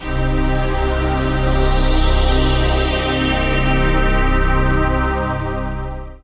PAD; Best Service Gigapack 1

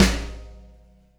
Combo Shard, Processed Drumkits

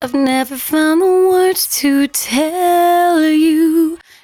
113bpm C#, Velvet Vocal; Zero-G

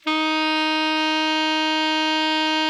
ALTO MF D#3, Saxophones; Greytsounds; Philip Wolfe David Crozier's Saxophones

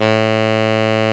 SAX A1; Best Service Alpha Dance vol.2

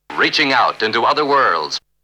Vocal; ZERO G - Datafile DISC 3

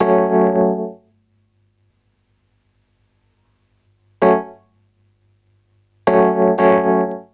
RHOD, Roots Disco; USB Soundscan vol.32; AKAI

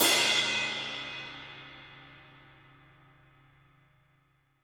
HiHat & Crash, Roland TD-20; HiHats

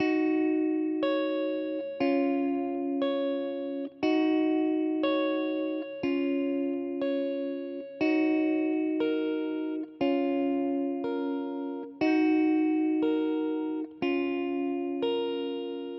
Guitar Loop 120BPM D#m; Instrument Loops; Guitars; Chords & Melodies; 99Sounds; Monosounds

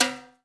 TIMBA, BR TIMBALES; Spectrasonics; Liquid Grooves 1 & 2

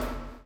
CHAIN; Spectrasonics - Backbeat

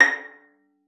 VIOLIN PIZZ A#6; Xsample VOL-5

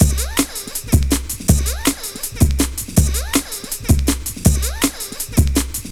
Loops, breaks; M8

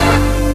HIT, STABS, House

